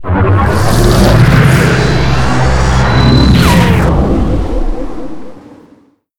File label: jump